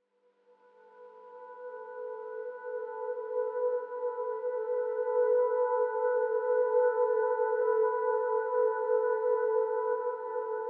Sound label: Crystal SOS tone Klang; inside